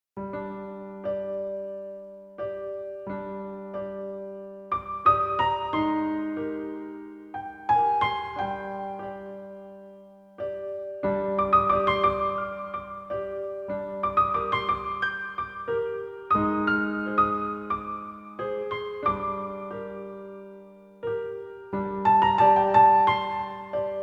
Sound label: minimal piano